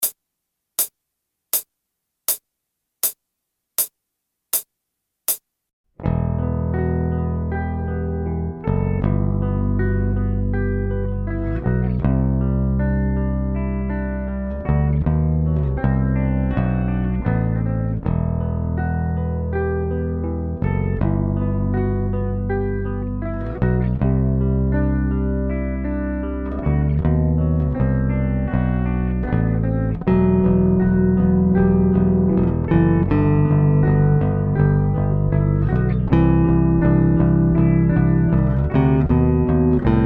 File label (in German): 8-tel Play Along ohne Drums